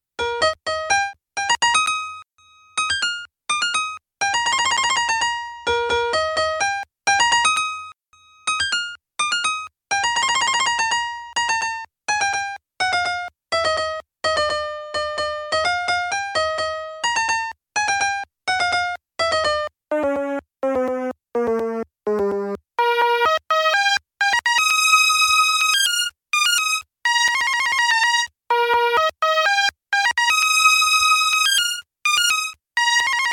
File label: piano; beethoven; empereur